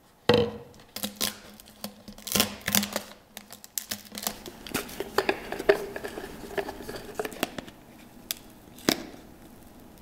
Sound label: asmr